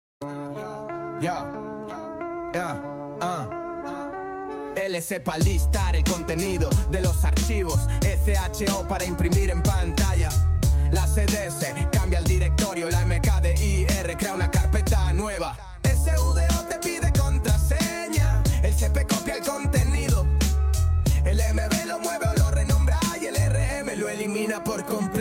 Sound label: hip hop